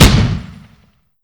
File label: automatic M2 Browning end, weapons; DCS